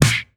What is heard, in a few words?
snare